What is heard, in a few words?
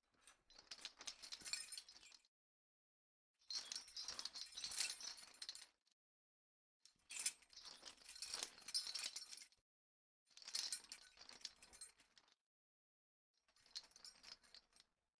generic chain hang freely